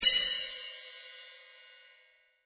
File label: crash